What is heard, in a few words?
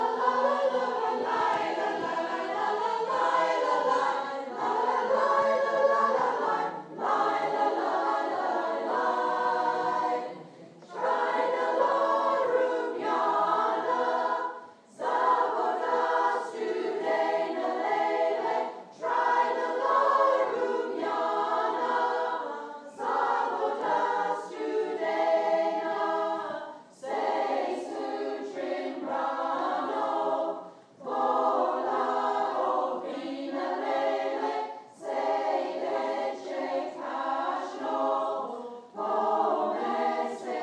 concert